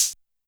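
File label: Hi-Hat Open Analog; YAMAHA RM1X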